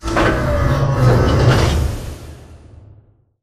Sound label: Robot Arm Right Deliver Close; Boomtown; Titanfall 2